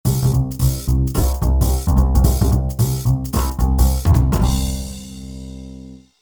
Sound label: Dogbass; Undertale 2 soundtrack 2018